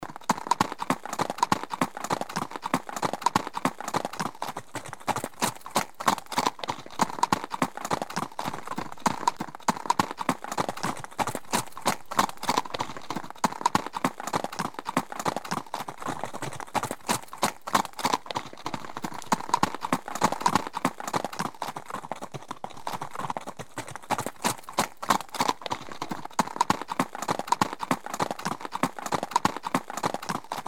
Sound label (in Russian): Troika loshadei skachet